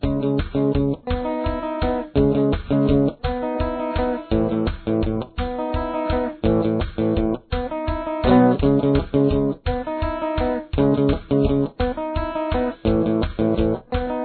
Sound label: verse main